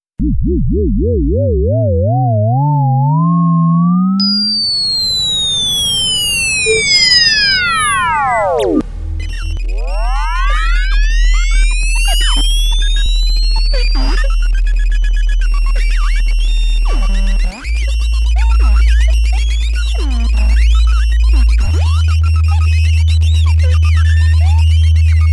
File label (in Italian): Sinusoidi e sul Rumore Bianco; Studio N° 1